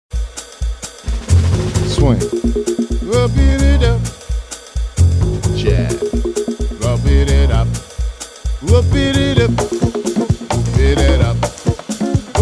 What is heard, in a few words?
JAZZ